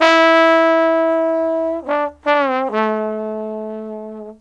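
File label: TROMBONE SOLO, BRASS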